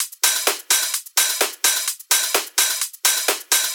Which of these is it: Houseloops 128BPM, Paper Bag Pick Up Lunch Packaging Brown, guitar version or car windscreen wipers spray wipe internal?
Houseloops 128BPM